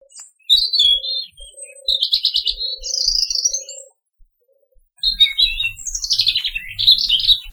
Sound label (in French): rouge gorge